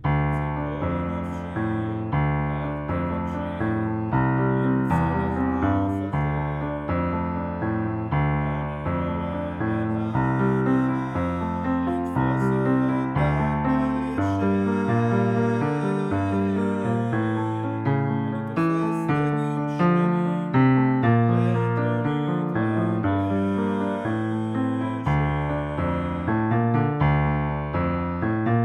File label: Piano L